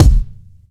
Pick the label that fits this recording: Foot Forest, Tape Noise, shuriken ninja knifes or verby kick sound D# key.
verby kick sound D# key